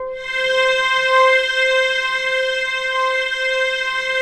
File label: DREAM, ETHNICSTUFF